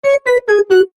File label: Message Tone